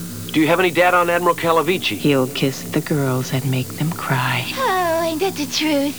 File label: kiss